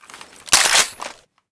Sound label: mdr misfire, weapons